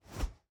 Fighting Woosh; SoundsCrate

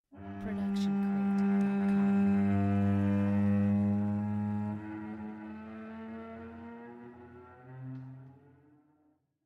Cello Bends Gliss; SoundsCrate

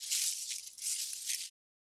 Leaves and Twigs Rustle; SoundsCrate

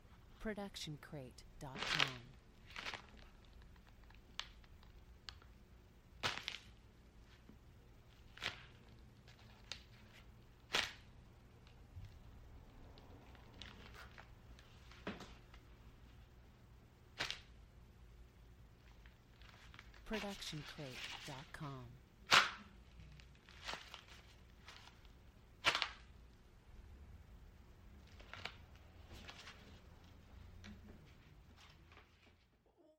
footfall possible outdoor steps; soundscrate

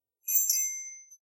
Short Wind Chimes; SoundsCrate

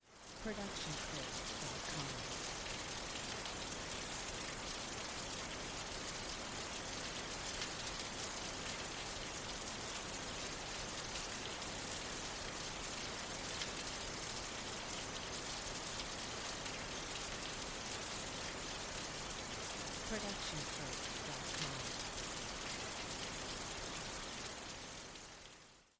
Rain Pouring Outdoors; soundscrate

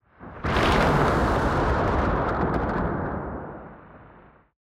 Wind Blast Explosion

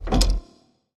metal switch; soundscrate

